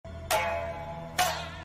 biwa nakime; ساز بیوا

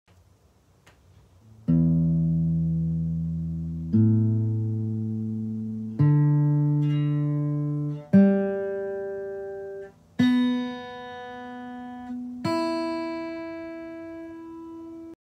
Guitar notes; نت های گیتار

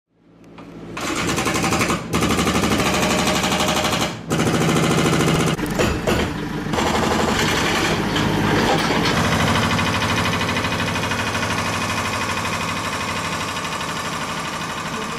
Hilti; هیلتی

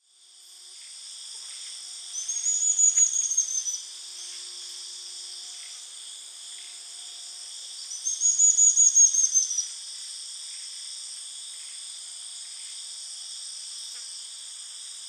Rio Madeira stipplethroat; پرنده گلونقطهای ریو مادی

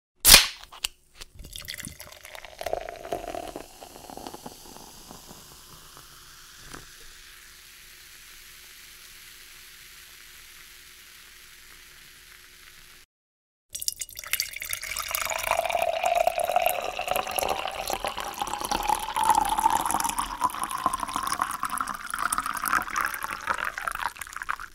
Pouring soda

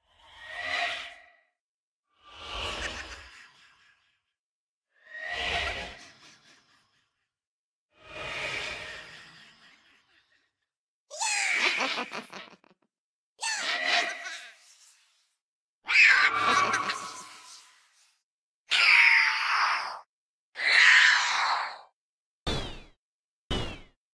Minecraft Vex

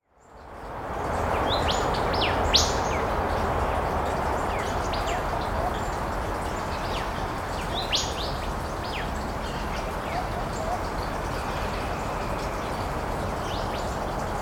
nature forest

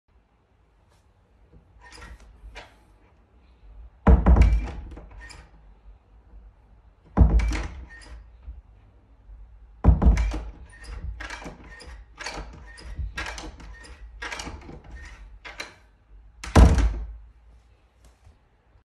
Door Handle

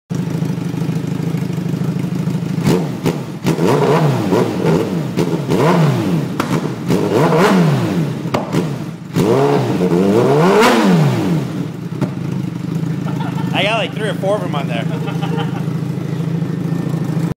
t rex motorcycle; موتور تی رکس

